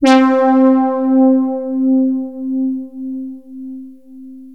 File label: Access Virus B; Synthworx